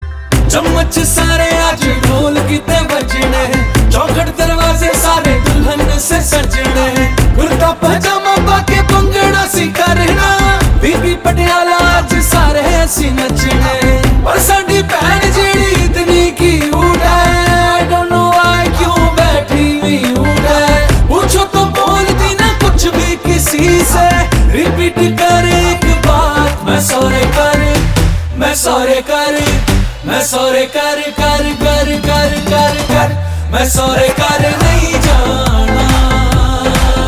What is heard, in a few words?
Dhol